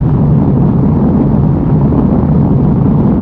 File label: earthquake